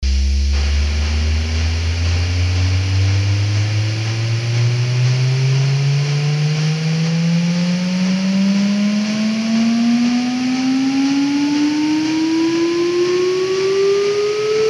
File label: Auditory Hallucinations - DMT Drone